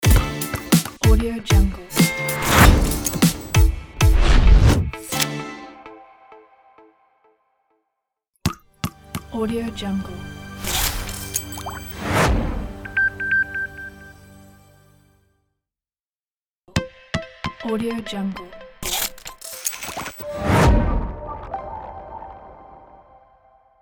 clean logo

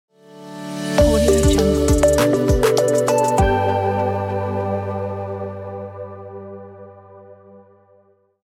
tech logo ident

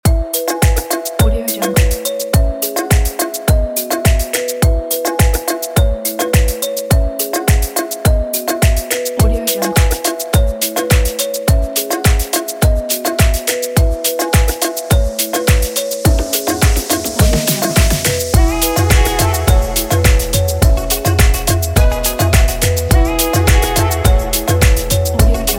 positive inspiring tropical house

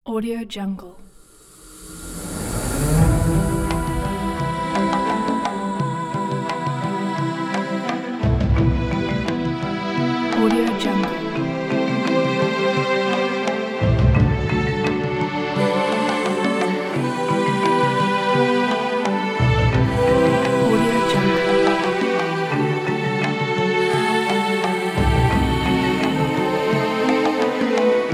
african uplands

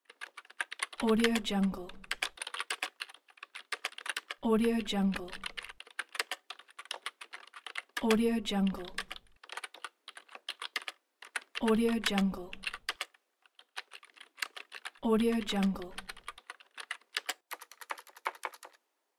typing on keyboard

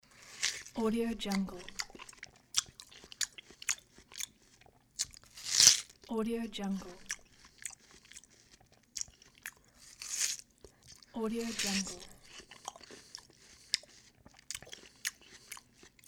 eating watermelon

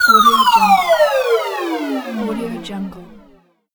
falling

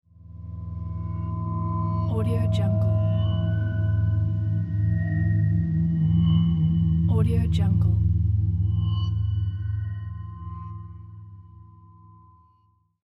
dark mystical sound

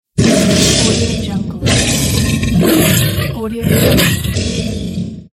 tiger roar

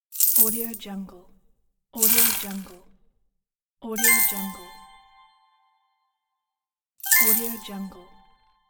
buy item

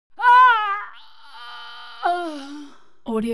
human vocal female fight die; smartsoundfx

